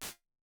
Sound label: Concrete Room